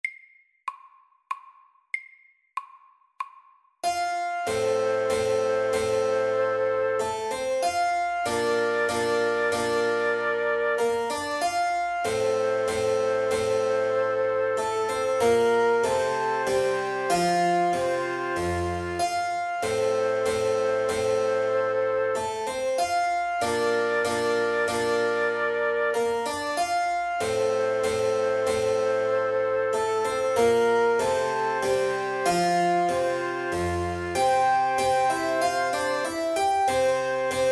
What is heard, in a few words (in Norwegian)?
Menuett SAKTE